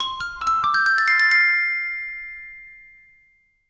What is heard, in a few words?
cheerful xylophone jingle